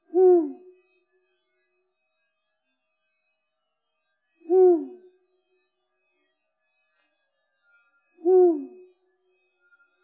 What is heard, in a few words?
bubo bubo; Przyroda ptaki i zwierzęta